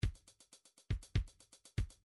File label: jazz rock, midi